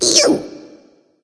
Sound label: ugh; smite; ratatoskr